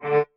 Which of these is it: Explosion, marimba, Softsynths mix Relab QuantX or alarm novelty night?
marimba